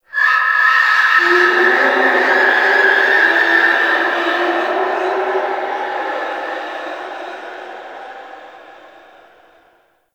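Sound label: Shipyards Distant Cry; Warframe; Grineer Shipyards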